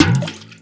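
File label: WATER BUCKET, percussive; Rarefaction; Poke in The Ear With A Sharp Stick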